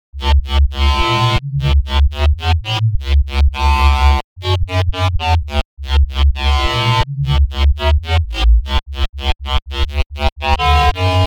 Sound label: neurobass example